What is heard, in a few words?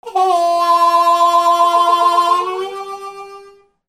MUSICAL